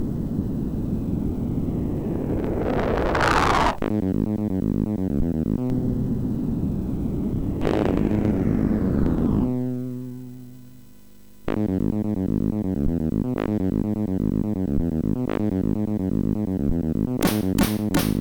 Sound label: Techno, MOS6581R4; hvsc; Eloquence